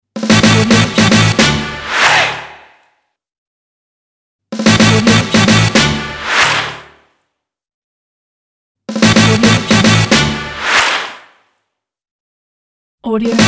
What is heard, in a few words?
break logo